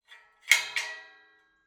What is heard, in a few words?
Iron gate, latch, sliding, rotating, lock, clang